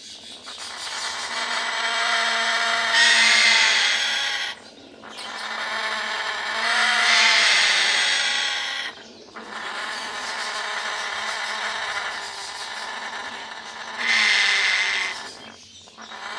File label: birds farm; best service Blue Box